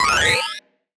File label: Retro Portal Crossing FX; Video Game; Cymatics; Video Game Sample Pack Vol 1